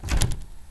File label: door open; baldis basics